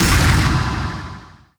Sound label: death coil impact; weapons; dota; abaddon